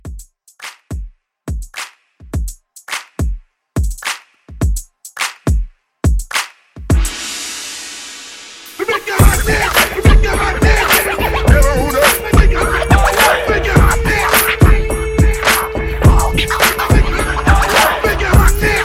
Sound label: Scratch Hype 105